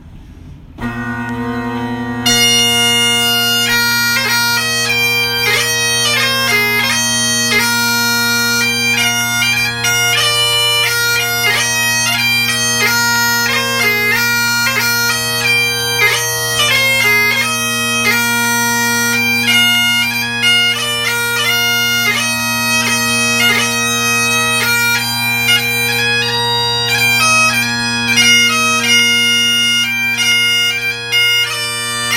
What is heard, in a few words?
Pipes and Drums March